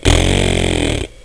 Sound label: razz; HP-15C; James Ullrey